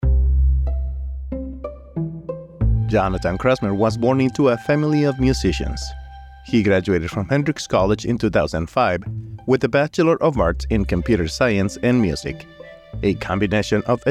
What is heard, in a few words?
Spring String Concert